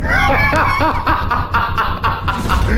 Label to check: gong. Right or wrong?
Wrong